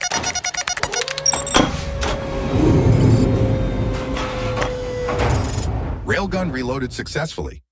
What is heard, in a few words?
Reload; Cosmic Rage; ships; Combat